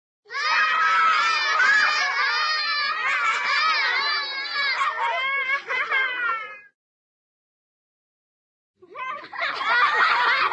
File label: crowd, children, small group of children laughing; cartoon